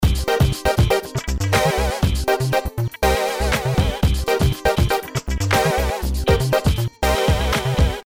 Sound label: brass vib